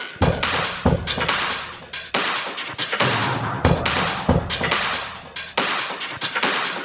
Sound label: Loop 140 BPM; vengeance essential club sounds-3